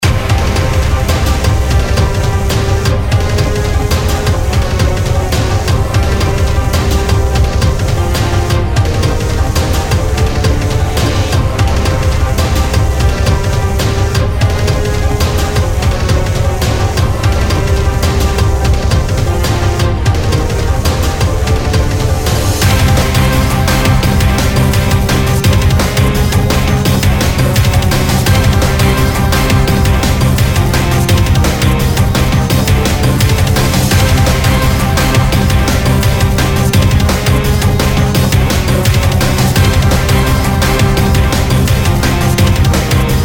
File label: Raid Boss; Mist Train Girls